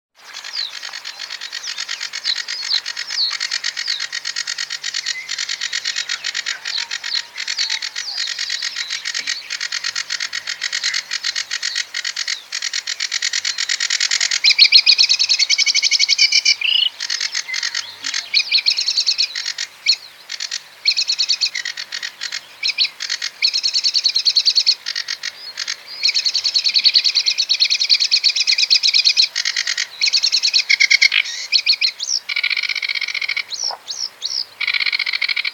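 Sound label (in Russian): kamyshovka barsuchok, Sedge Warbler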